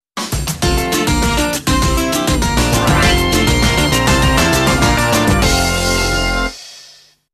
Jingle - Act Clear

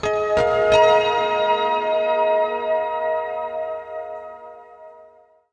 medal silver, harmonious; Audiosurf; chillout

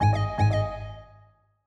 Ring In; Longhorn 9X Sound Scheme by David Mounder

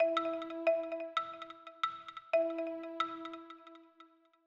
Proximity Connection; Reincarnation of Windows 8 Sounds in fake Longhorn (Samsung Theme for WinXP) style by David Mounder; Longhorn 8 Sound Scheme by David Mounder

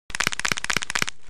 Crack; Princess & Conquest v0.20.06

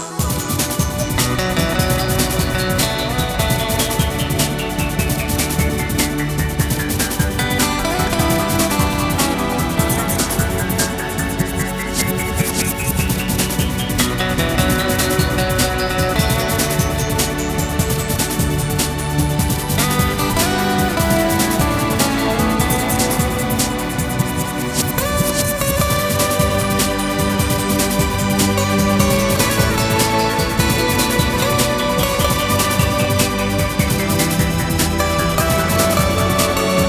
Rhythmics; Vaporwave

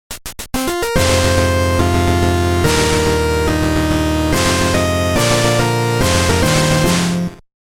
Victory

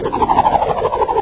fan; Junkbot

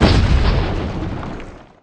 explode; Manic Miners

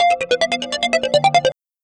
power shuffle; Audiosurf; chillout

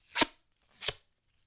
power erase; Audiosurf; cyclone

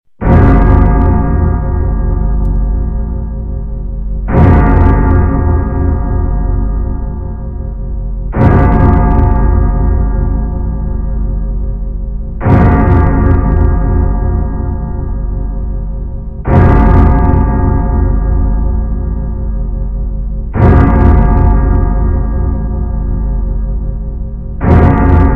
Skele Bells; Princess & Conquest v0.20.06

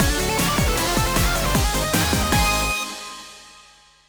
Jingle; Sonic & All-Stars Racing Transformed - Original Sound Version

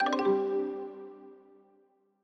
Chimes; Longhorn 9X Sound Scheme by David Mounder

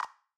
Menu Command; Longhorn XP Sound Scheme by David Mounder; Reincarnation of Windows XP Sounds in fake Longhorn (Samsung Theme for WinXP) style by David Mounder

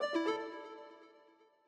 Foreground; Longhorn Ten Beta Sound Scheme by David Mounder

